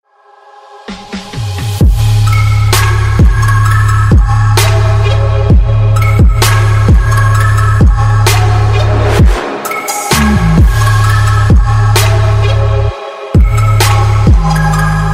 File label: Abyss trap ringtone